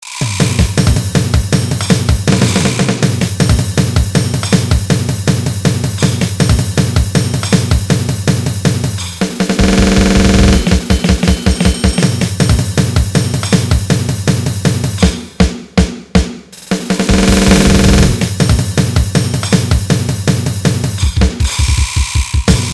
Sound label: Drum Dance